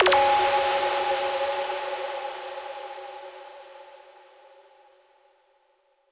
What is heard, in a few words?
Start Up FX - F#; House; Various FX